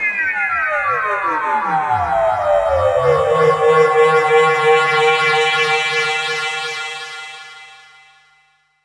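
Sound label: SYN left, Production Effects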